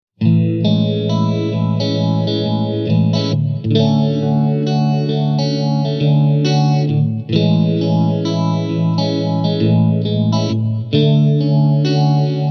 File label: Blackstar ID Core 40 V2 clean